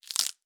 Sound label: Bone; Survival Game Sounds - Lite Edition; Combat and Hunting